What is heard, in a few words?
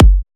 Kick - F; House; Drum One Shots; Verbal Kick